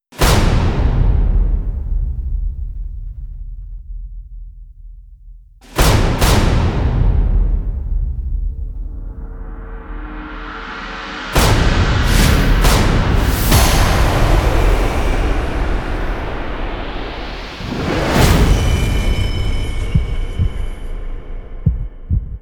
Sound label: drums